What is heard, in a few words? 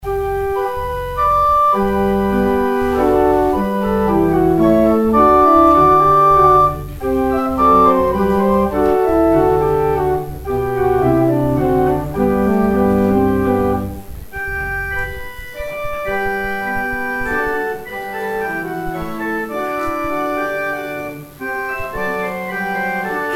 organ interlude